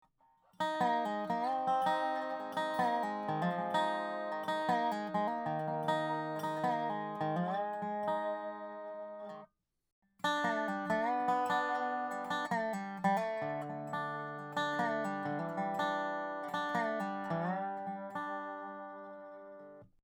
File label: tuning